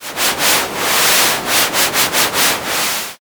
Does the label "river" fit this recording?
Yes